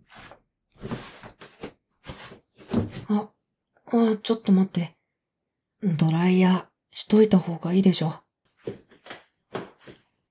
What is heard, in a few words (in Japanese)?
ドライヤー